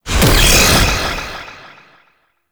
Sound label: Boreal Teleport Out; Warframe; The New War; Archon; Abilities